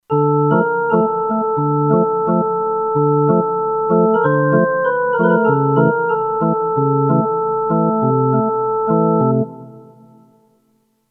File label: Chiffy Pipes, Kurzweil K2500X; Factory Programs